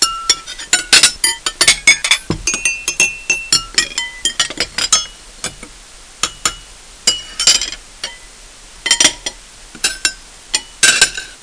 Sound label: dishes